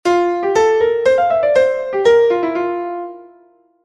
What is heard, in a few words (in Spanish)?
BINARIO Y SUBDIVISION TERNARIA